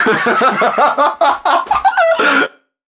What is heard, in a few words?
Vocal Oneshots; Vengeance Samples; Vocals